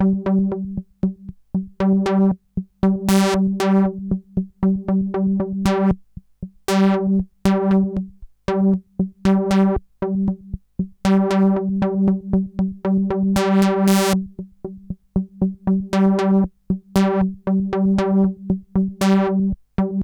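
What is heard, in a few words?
sample and hold G1; Best Service Dream Experience; R100M S+H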